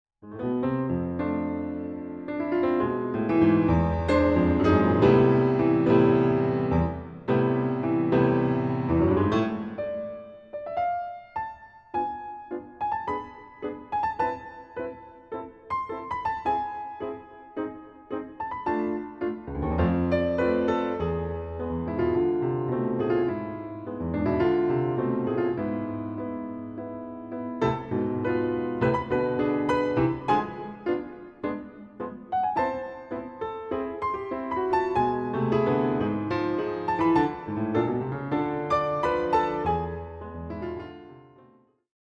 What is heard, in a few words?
piano